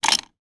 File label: household hotel room keys place down on cabinet